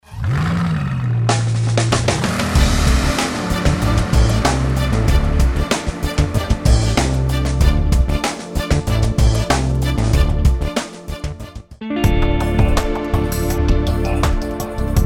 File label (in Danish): lego musik